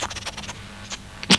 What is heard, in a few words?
hamster; Little Red Riding Hood